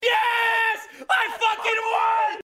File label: win